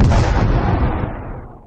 gunfire; BALLY; CORVETTE; Corvette-Original

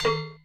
tonk; BALLY; sfx; TWILIGHT ZONE; Pinsound; Twilight Zone Reorchestrated

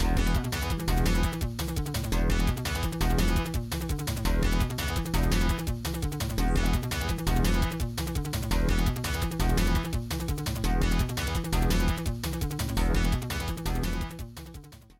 powerball prelaunch; BALLY; TWILIGHT ZONE; Pinsound

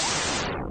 failed; BALLY; CORVETTE; Corvette-Original

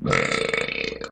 burp

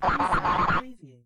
robot chatter; BALLY; TWILIGHT ZONE; Pinsound; Twilight-Zone-Full-Reorchestration

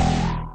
bing; BALLY; CORVETTE; Son Corvette Original

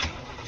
starter; BALLY

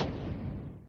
add a credit; sfx; WILLIAMS; INDIANA JONES; Indiana-Jones-Original

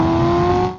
car motor

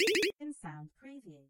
escape; BALLY; TWILIGHT ZONE; Pinsound; Twilight-Zone-Full-Reorchestration

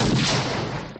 launch ball; sfx

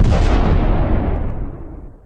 shotgun; BALLY; CORVETTE; Corvette-Original

